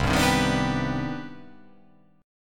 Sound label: C Minor Major 9th C